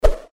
Fighting Swing Sound; FXHome

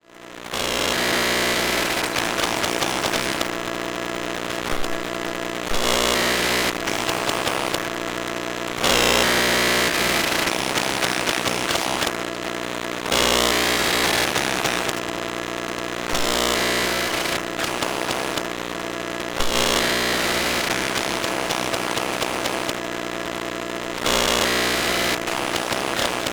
EMF Smartphone, Electromagnetic Fields; Soundbox Library; Electromagnetic Fields Collection

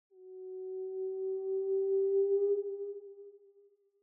Whale Call Mid (echo), Underwater; Varazuvi

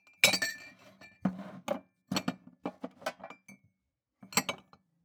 Cupboard-Dishes, Rummage; SoundBits

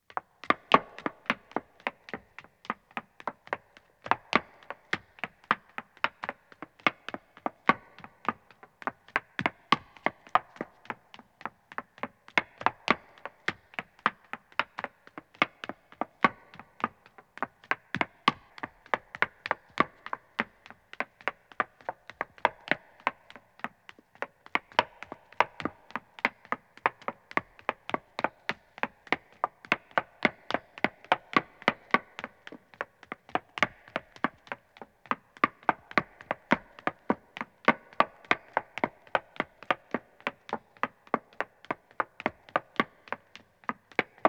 Stone Running, 1 Woman Starts, Footsteps; BBC Sound Effects Library; Footsteps II

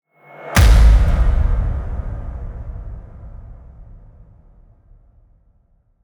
Cinematic Hit; SoundBits; Cinematic Hits Transitions

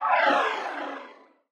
Movements; 99Sounds; The Weird Side Samples

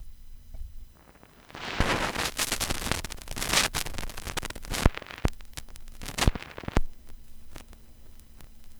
vinyl crackle; 99Sounds; Vinyl Noise SFX

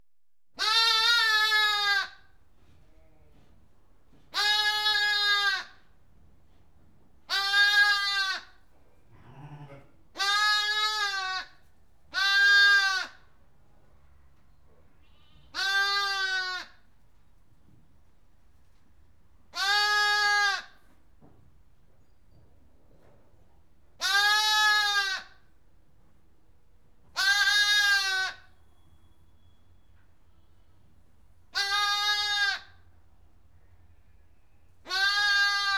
One Lamb Bleating In Barn; Livestock; BBC Sound Effects Library

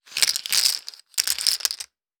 Poker chips dropped on to chips; Timothy McHugh; Playing Cards & Betting Chips

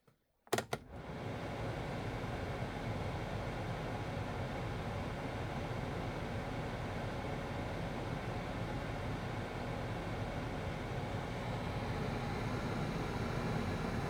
Ford transit connect T200 airco interior; Eneas Mentzel

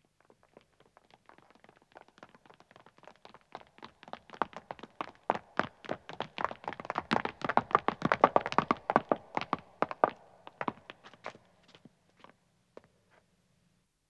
Stone Running, 3 Men Approach, Footsteps; BBC Sound Effects Library; Footsteps II

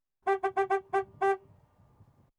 Landrover Safari Diesel Horn; Farm Machinery; BBC Sound Effects Library

